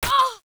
Voice Player Hit; Warframe; Caliber Chicks Minigame

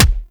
Punch, Weapons; Warframe; Scaldra AK

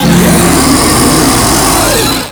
Proto Infested Ancient Taunt Scream; Warframe; Abilities

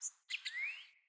Bird Song; Paragon Bird Guide

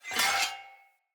Sphere Sword Whoosh Accent

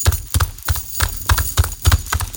Walking Loop; Warframe; Kalymos

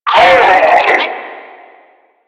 Death; Warframe; Lasrian; Lasrian Enemy B

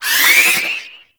Hand Bomber Proj Warning; Warframe; ManInTheWall

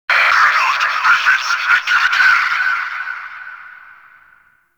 Exterior Notification; Warframe; Grineer; Fortress; Ambience

